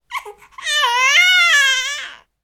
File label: baby cooing